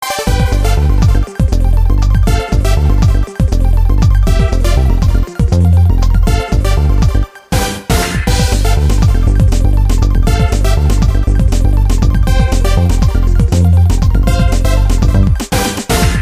Online Menu; bomberman blast

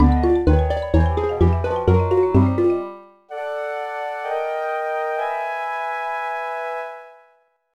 BGM Balloon Landing; Irodzuki Tingle no Koi no Balloon Trip; NDS

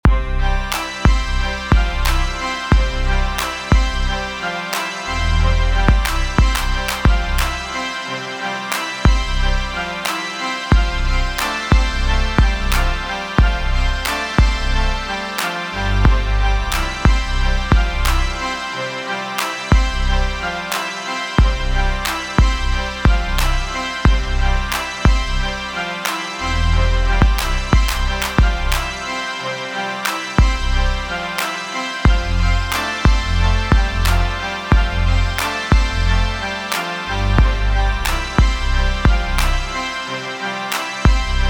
loop drums

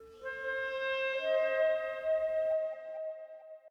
Arp; Proteus

